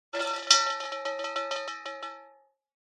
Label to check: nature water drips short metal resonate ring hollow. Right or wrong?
Right